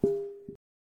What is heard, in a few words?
bounce